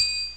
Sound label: triangle